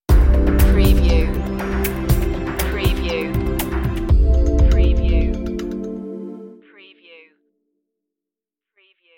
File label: deep shimmer stinger